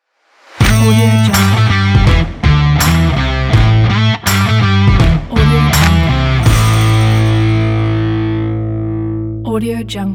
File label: stomps claps rock logo